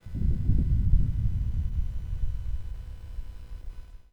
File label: Rumble